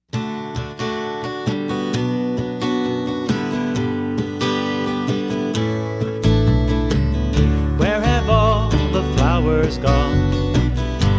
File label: folk medley